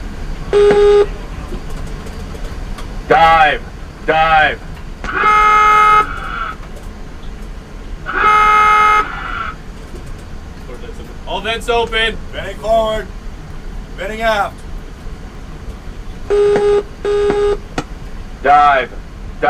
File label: dive; Submarine - Diving sequence - PCU North Dakota (SSN-790)